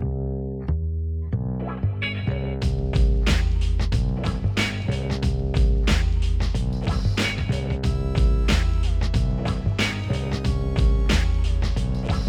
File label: 92BPM; InVision Interactive; 40 Oz Phat Slammin